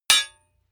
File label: hammer hit metal hard